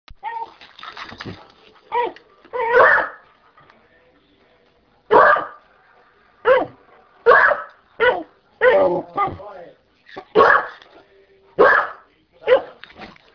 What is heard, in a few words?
woof